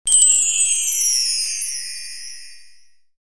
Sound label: cartoon chime; TECKNAT